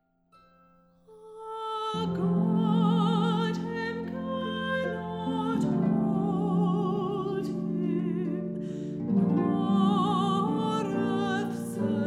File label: 4 Girls 4 Harps